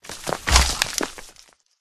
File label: forest spirit buff in